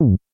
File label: error